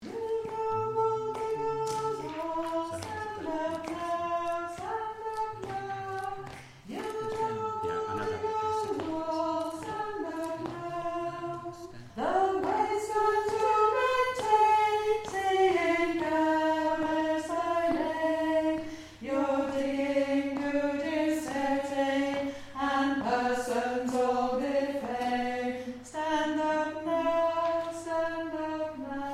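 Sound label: Soprano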